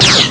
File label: laser; Amiga Soundtracker Sample Packs